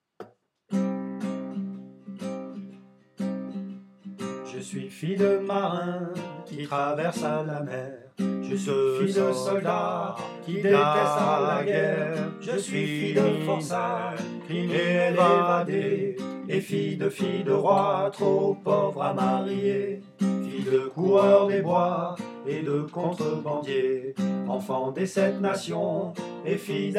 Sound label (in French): Trois voix, chorale